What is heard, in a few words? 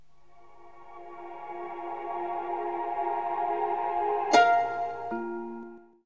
strings, Sad Loops